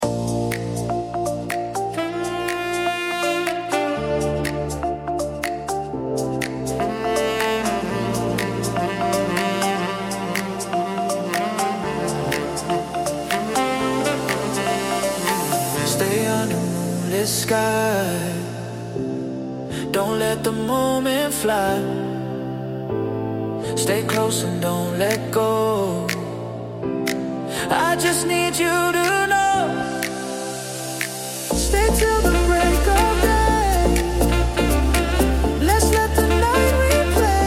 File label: summer sax